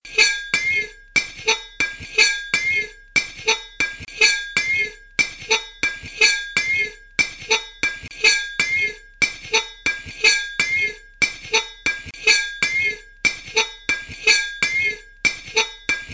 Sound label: ruler bottle